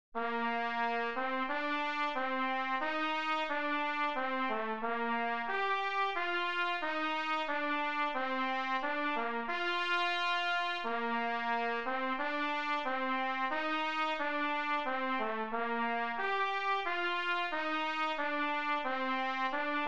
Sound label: trumpet only